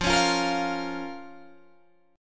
F Suspended 4th Sharp 5th F, Guitar Open G

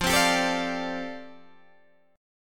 F 6th Add 9th F down Guitar Open D, chord

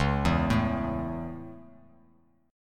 Db Minor Sharp 5th Db; arpeggiated; Dbm#5; JGuitar

